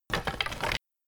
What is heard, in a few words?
death Bone; endless world idle rpg gamerip 2019